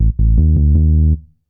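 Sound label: synth bass, ambient, 160, C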